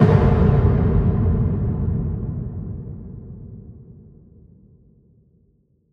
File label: Deep Impact; Psytrance; FX